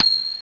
TING

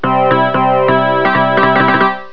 EPIANO; 999wavs

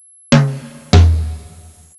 TOMTOM; FISHWISH

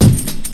KIK SHOT; BEAT2000

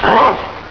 RUFF

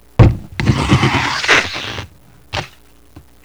TAPE GUN

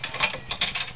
COIN DROP

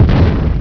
FIRE, TANK; ATANK; SHOOTEM

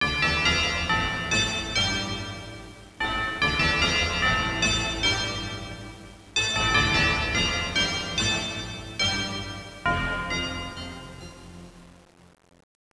GEARS

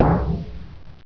TING GONG

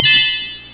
TINKLE; BELLS

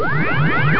disruptor; ParadroidII

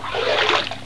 SPLASH

